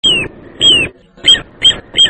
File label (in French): Petit Gravelot; Charadriides; Le Petit Gravelot